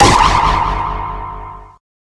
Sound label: impact stun; video games; source engine; tf